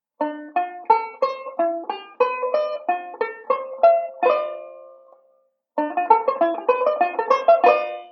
Passing Pattern, banjo; Beyond Chord Melody Volume II

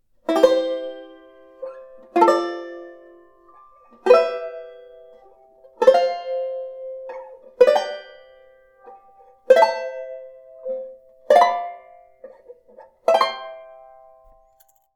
1st Triad Scale; Beyond Chord Melody Volume II

